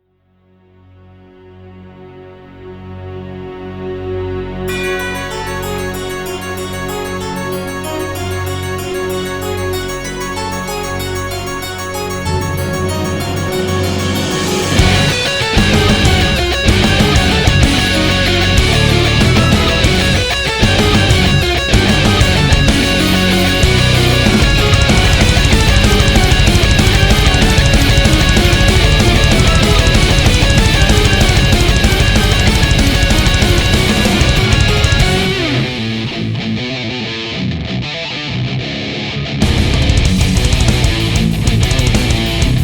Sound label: (Instrumental)